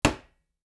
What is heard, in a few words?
mug down; drinks